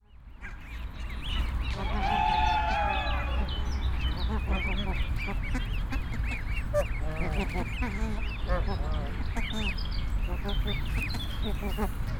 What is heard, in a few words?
birds, Djurgardsvagen Stockholm